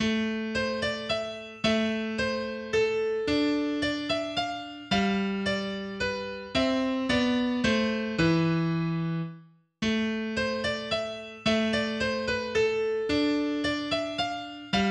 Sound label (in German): Menuet, mehrstimmige Gitarrenstücke; Suite V; Weiss